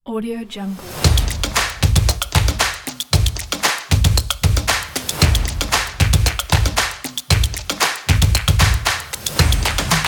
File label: upbeat percussion